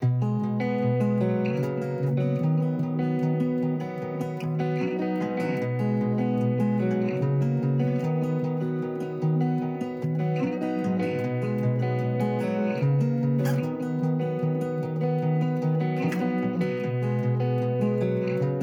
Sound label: (Instrumental); Life is Strange: Before the Storm